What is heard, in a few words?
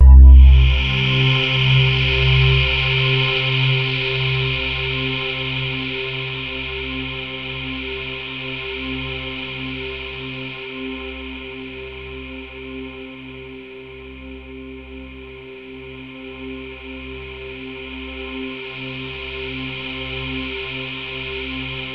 SNOWFALL; MORPHEUS